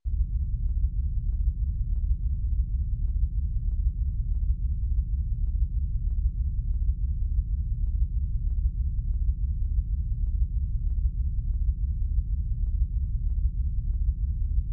engine; Perfect Dark; n64 gamerip

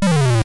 Sfx Climb down; Pizza Tower early build test ost

